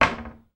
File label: household cupboard door close kitchen; sound effects one